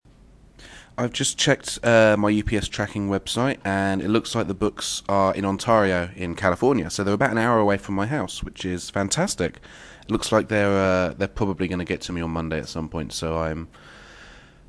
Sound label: hooray